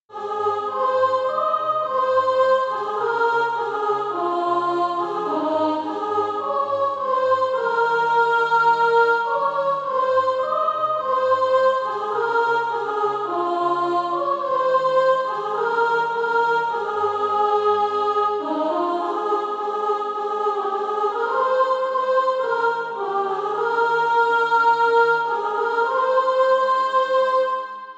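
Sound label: soprano, Practise Music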